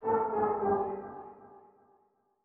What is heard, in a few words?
ethno, 125, A